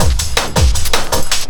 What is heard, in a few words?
Drum & Bass, 160 BPM; LOOP; Ueberschall; Drum & Bass Flash Trackz